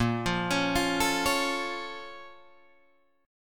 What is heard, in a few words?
Bb Minor Major 7th, Guitar, Open G